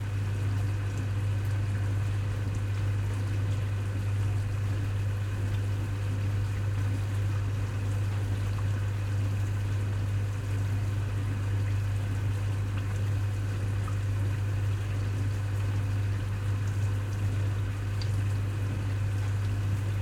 Quiet Room Flat Kitchen Dishwasher Machine Engine Running Water Dull; Systematic Sound; General Ambience Series; Quiet Rooms 01